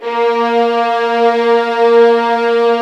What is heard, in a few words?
A#3, soft violins; Q Up Arts; Denny Jaeger Master Violin Library